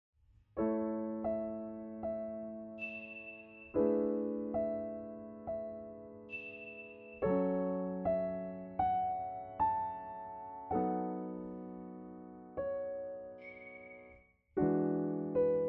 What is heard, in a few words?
Wild Rose P8 SDC Reverb